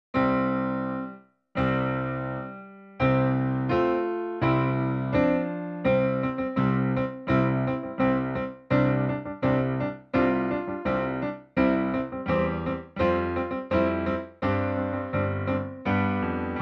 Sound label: no metronome